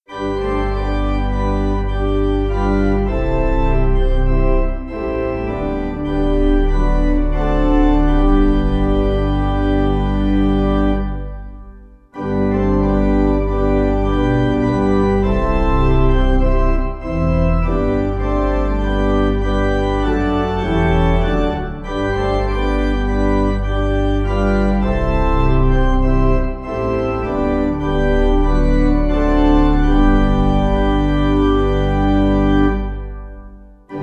Jesus Was On The Tree, Pipe Organ

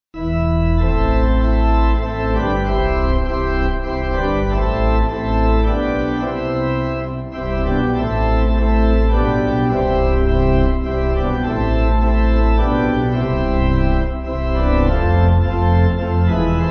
Normandy, Pipe; God Hold Us

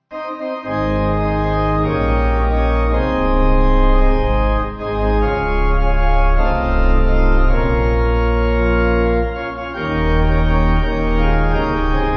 There Is Never A Day, Pipe; Sellers

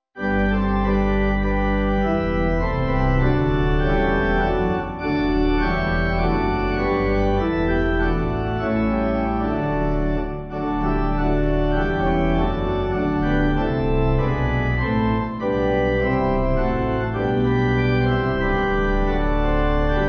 Pipe

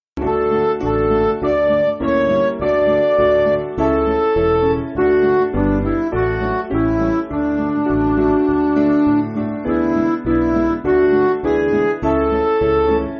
Midi; Welcome Happy; Salve Festa

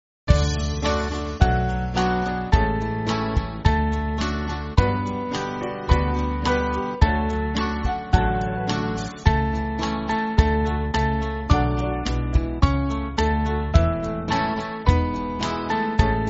Band, church music

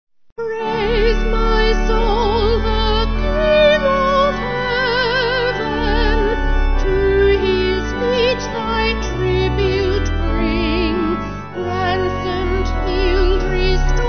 Vocal Organ

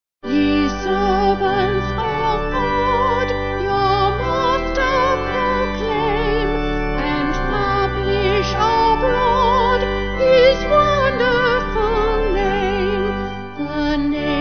Vocal Organ

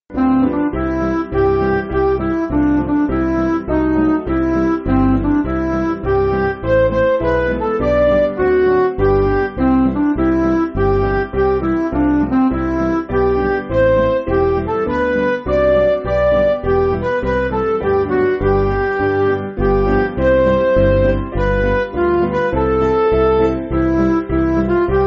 Midi

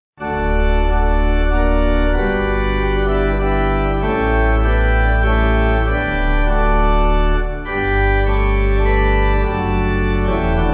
Pipe

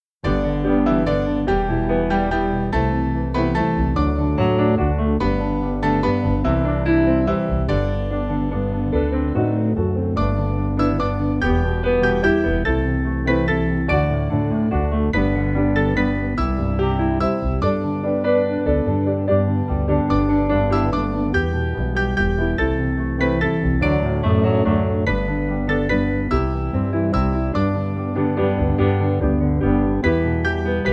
Skye Boat Song Piano; Hush Now My Child

